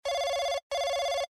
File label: household telephone digital ring slow